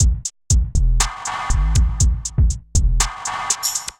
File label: Kit, Drums, Hybrid; Lit Kit; Ableton; Factory Packs; Drum Essentials